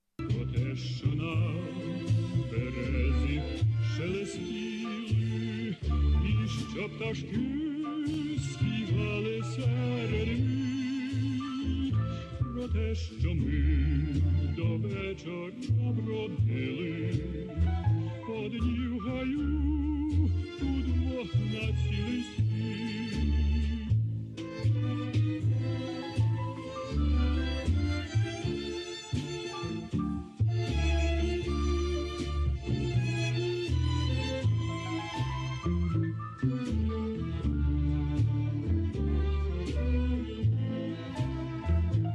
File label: Ukrainian tango